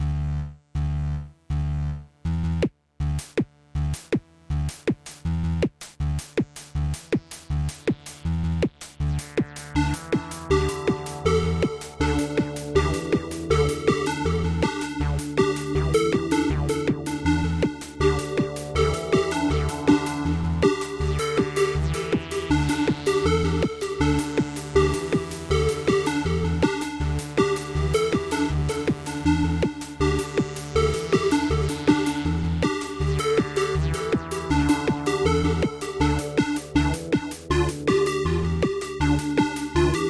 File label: synth